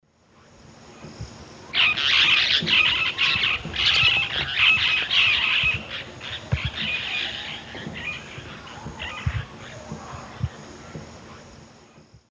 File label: Touit huetii, flock flight calls; S. America; Eastern Lowlands